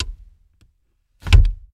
Car Releasing A Parking Brake Interio

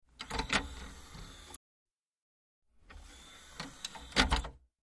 Open Cd Door, computers

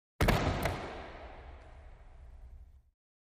Foot Stomps Hard, racquetball

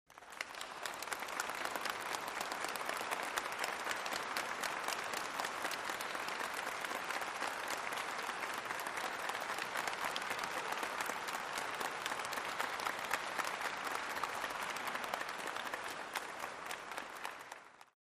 Large Crowd Applause

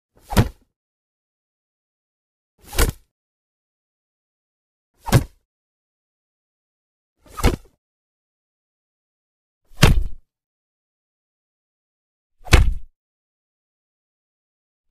Blocks, punches kicks hits; bones

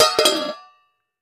Coffee Can Drop On Cem; barrels buckets & cans

